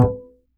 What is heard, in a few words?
Upright Acoustic Bass; 1ST POSITION; Larry Seyer